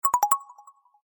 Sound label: notification; Etuline